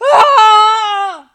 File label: death; arachna